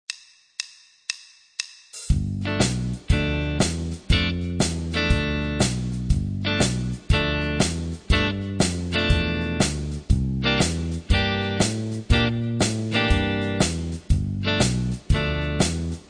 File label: Playback, Rock & Blues Solos; De Haske